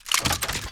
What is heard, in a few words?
Gun reload